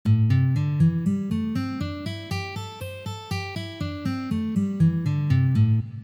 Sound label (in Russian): Minornaya pentatonika 1 poz